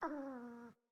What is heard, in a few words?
Kitten Snore; Catbrow